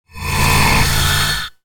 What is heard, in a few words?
Sci-fi Transition